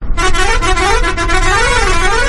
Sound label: Kerala Bus Musical Ringtone